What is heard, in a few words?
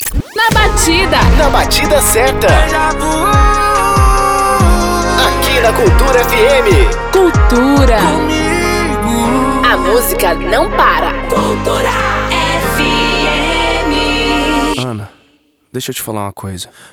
intro music power